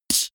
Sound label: beatbox snare